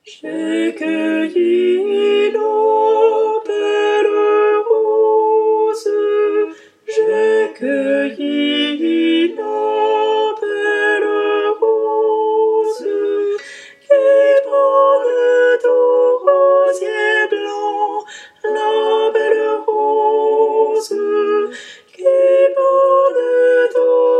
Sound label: tenor; J'ai cueilli La belle Rose